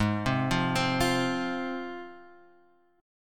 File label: G sharp 6th, Guitar, Half Step Down